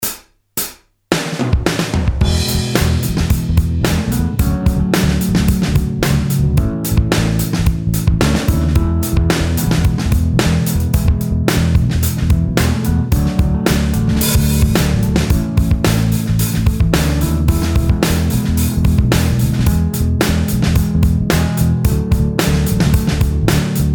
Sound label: slide backing